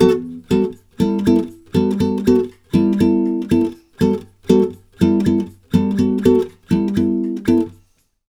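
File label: 120 guitar G, brazil bossa; USB Soundscan; AKAI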